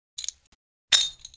grenade pin pull; Spearhead Demo; weapons; foley

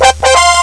Koji Flexes; Koji the Frog 2.0.1

